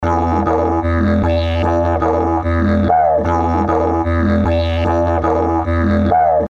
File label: didgeridoo